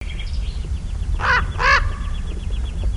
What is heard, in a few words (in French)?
grand corbeau